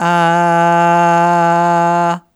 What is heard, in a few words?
Aah Short F2 Dry